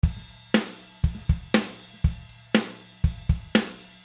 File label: pop r 120 c; Drumloops